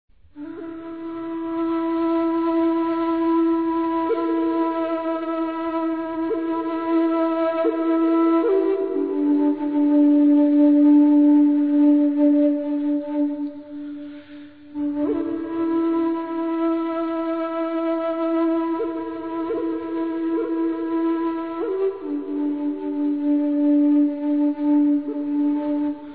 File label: piece for solo flute